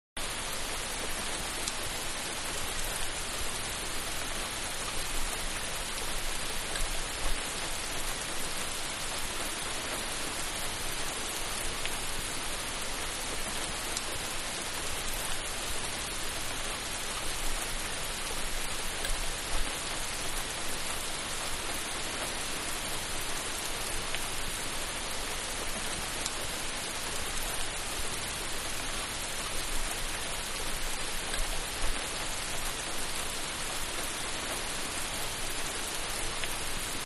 Rain Heavy; Weather; Manhattan Production Music

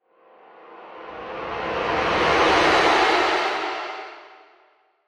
Whoosh Power Rush; Andrew Fleming

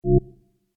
Rollover, Short; Mouseovers; Multimedia; Rollover: Short, Effect

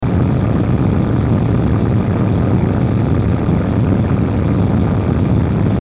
Static Low Scrape; Production Element Imaging Element Accent Transition; Blastwave; Static Sound Effects

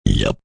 Burp Yup, Burp

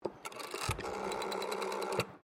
TELEPHONE WOODEN AUTOMATIC DIALING WALLPHONE DIAL; Various old telephones; Electronics

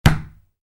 Soccer Ball: Kick Ball; Football; Sports; Soccer Sound Effects; Soccer (Sound Effects:Sports); Stuart Duffield

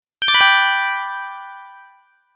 Close Menu, Beep; Beep Sound Effects

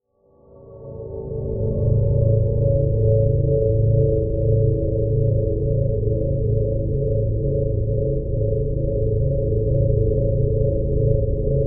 Bed Tremolo Reverb Pulse; Blastwave; Beds

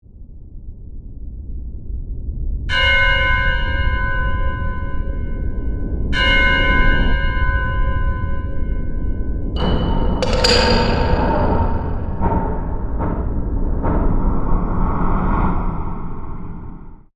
Production, Element, Composition, Bell, Hits; Compositions

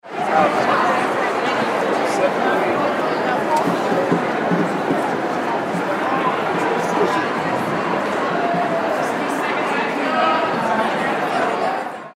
Crowd Arena Chatter; Crowds; Backgrounds; Sports; Crowds (Sound Effects:Backgrounds); Blastwave

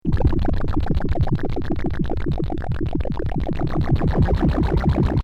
Bubble Machine Liquid Gurgle Pump; Cartoon Sound Design

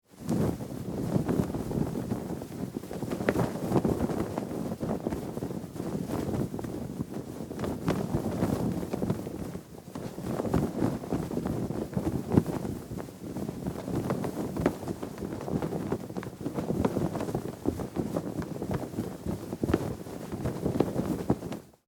Flag Foley Cloth Flapping In Wind; Miscellaneous Household

